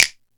hand clap D key, Royality free hand clap sound tuned to the D note. Loudest frequency: 4142Hz; percussion; single hits one shots